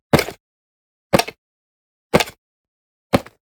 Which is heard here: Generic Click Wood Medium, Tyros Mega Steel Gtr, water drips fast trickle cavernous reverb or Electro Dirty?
Generic Click Wood Medium